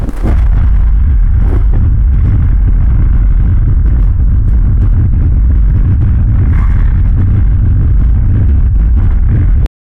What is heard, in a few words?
raging fire